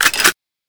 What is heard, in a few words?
crate